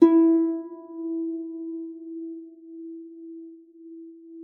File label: HARP D#4; MF; Xsample VOL-8